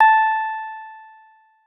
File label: piano syn; un suono un po' simile, ma decisamente non uguale; inviluppo simile a quello del pianoforte